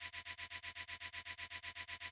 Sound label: reels rotate loop; Beauty Fruity; wazdan